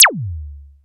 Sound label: ELEK SQUEEK; ELECTRC